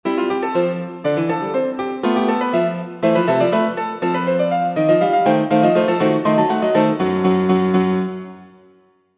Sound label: random piano